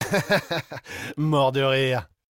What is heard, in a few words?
hahaha